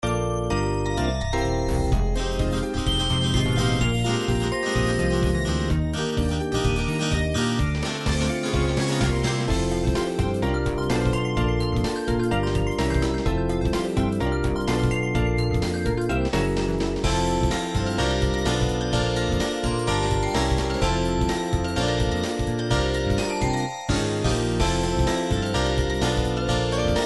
super mario world overworld electronic jazz remix xg; game system super nintendo; sequenced